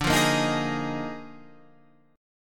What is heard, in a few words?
D sharp Major 7th Suspended 2nd Suspended 4th, D, guitar chord